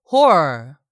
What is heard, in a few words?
horror